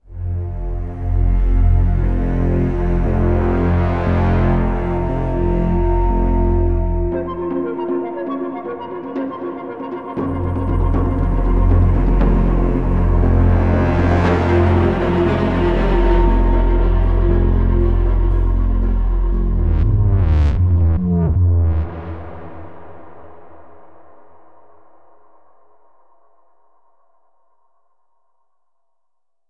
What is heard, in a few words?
Suspense Oneshot